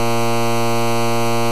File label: Tractor Beam